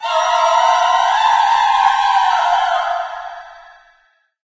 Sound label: loud angry little witch, music; Left 4 Dead 2; Source Engine